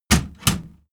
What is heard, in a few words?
cat flap